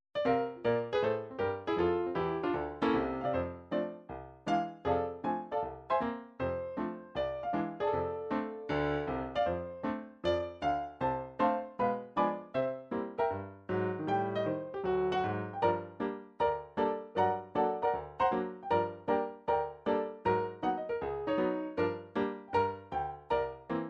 jazz, midi

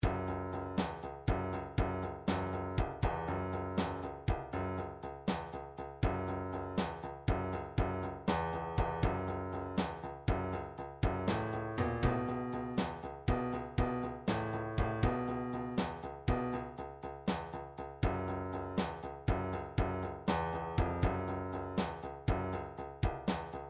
midi drums, blues

